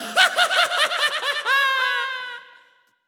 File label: Evil Shreik